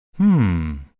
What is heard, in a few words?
"hmm"; هوم